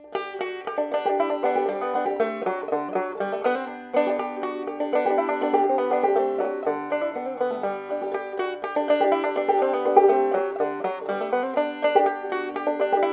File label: Banjo G